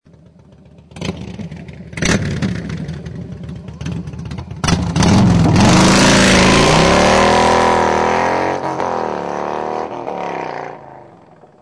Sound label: Burnout, exhaust; Gunships